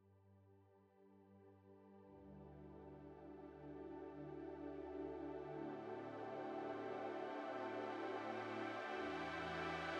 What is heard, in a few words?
Pad Wet